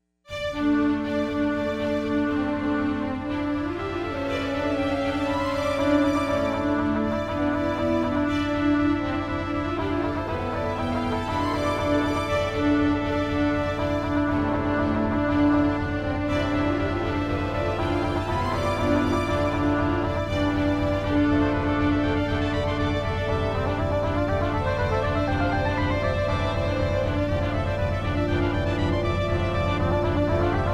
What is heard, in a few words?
Fanfare; New Year's